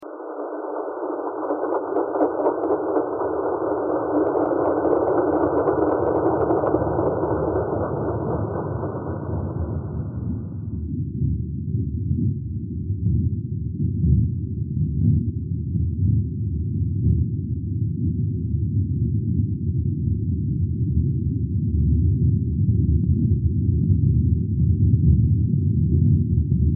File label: thread harmonic